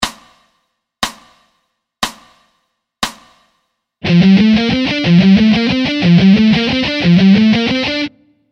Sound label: Alt Picking; Pattern A